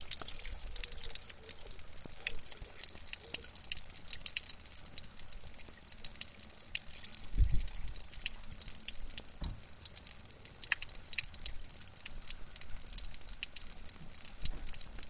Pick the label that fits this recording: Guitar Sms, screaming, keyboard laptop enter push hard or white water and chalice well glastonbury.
white water and chalice well glastonbury